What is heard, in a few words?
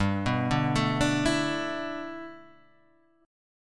E 7th Suspended 4th G, guitar; E7sus4/G arpeggiated; JGuitar